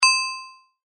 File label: precipitate chimes; phet io wrapper sonification; molarity